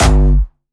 Jumpstyle Kick G1, vengeance essential bassdrums; vengeance essential club sounds-3; VEC3 Bassdrums

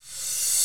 Cymbals Reverse Crash; Vengeance Samples

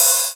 Cymbals HH Open; Vengeance Samples

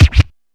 Scratch Kick; Percs

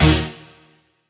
Synth Cuts; Vengeance Samples; VES1 Instruments

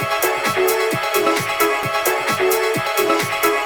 Special Sounds Root C# 131 BPM; Vengeance Samples; VEH3 Special Sounds

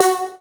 Synths F#; vengeance essential club sounds-3

